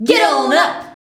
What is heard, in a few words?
VOX, Singin